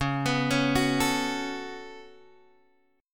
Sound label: Db 7th Suspended 4th Sharp 5th Db down Guitar Open G